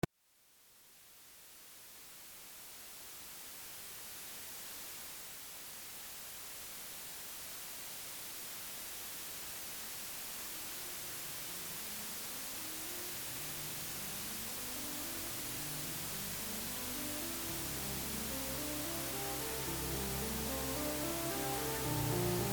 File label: noisy filler